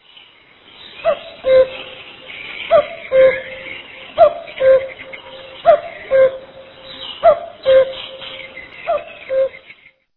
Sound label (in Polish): kukulka